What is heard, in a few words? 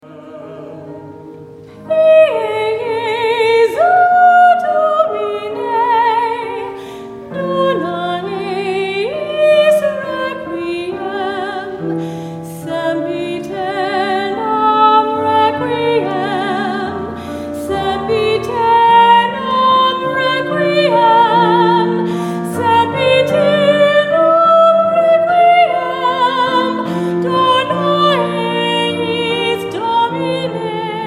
Soprano Pie Jesu Requiem; Rutter